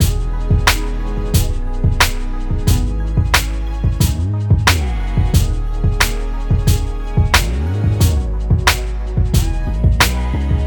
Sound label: progressions with drums